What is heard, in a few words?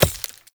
Throwing Knives Impact Vegetation; Weapon Impacts; Player; Environment